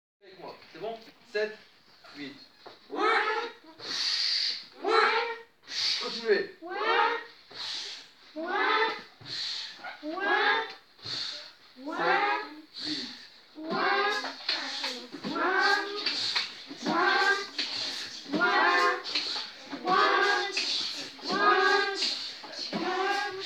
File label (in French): Bruitages industriels mêlés au beatbox